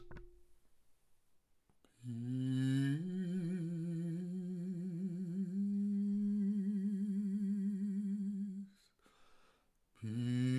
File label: Peace Chant